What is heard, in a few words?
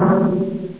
blurp, drums